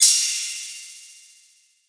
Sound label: Crash